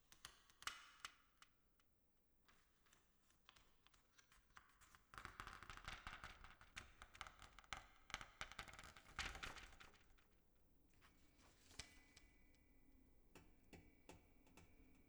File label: Dragonfly